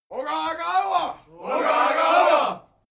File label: native american shout